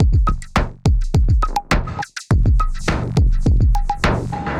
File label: Analog Face Kit 104 bpm, Drums; MIDI Clips; Ableton; Mood Reel